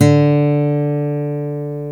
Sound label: GTR, Dual Guitar; Club-50 - Foundations; Paul